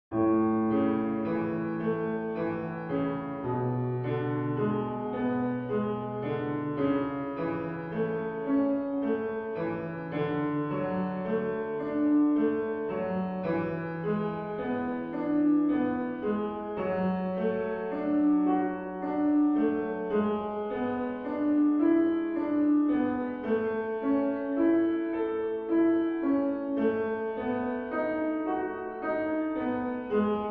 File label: left slow; czerny40